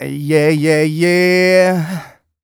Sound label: 128 uh e-flat, mal, Vocals